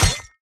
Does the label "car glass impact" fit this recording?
Yes